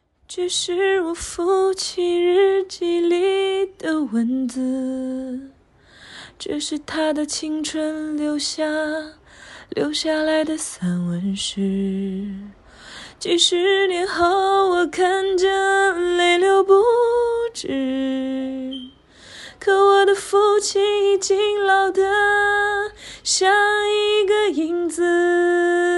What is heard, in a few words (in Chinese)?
清唱